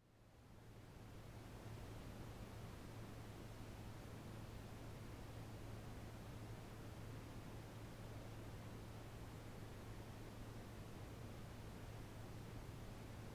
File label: town ambient; archive-world